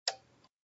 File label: Timer